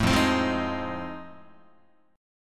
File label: F 7th Sharp 9th Guitar, chord; F7#9 strummed